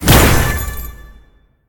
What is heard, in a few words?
fire; Smite; Tiamat